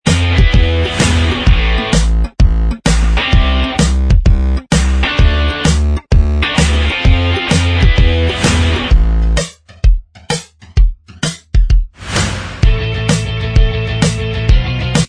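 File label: instrumental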